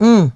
mmm

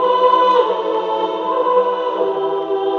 voice, Loops, Sad

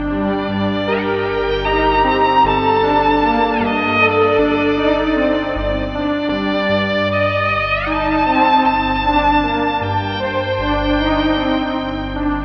strings and keys, Sad; loops

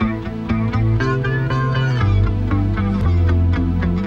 guitar, Sad

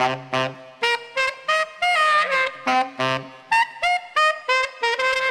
sax, loops, phonky

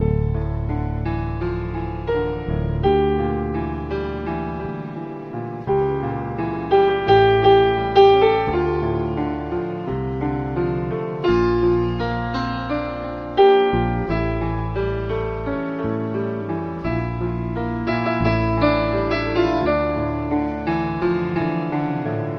piano alone, Sad; loops

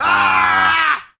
scream group of men; ooh ahh

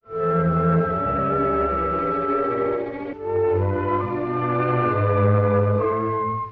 strings, Sad

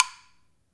rakeram, Misc Percussion Ticks

